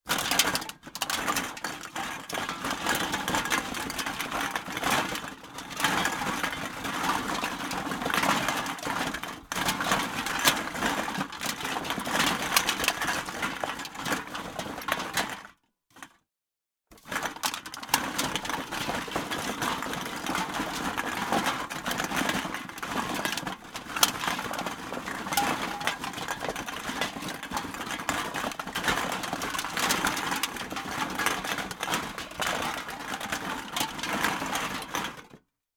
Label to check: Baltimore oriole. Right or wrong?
Wrong